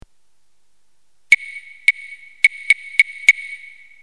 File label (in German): klangholz